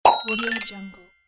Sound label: cartoon pop gliss; applehillstudios